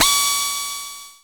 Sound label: DRILL, INDUSTRIAL